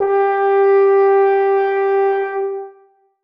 horn g4; Sonatina Symphonic Orchestra

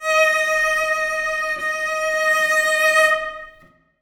cello d#5; Sonatina Symphonic Orchestra

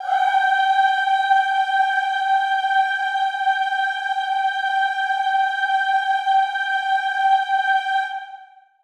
chorus female g5; Sonatina Symphonic Orchestra

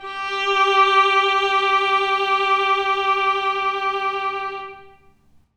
violin g4; Sonatina Symphonic Orchestra

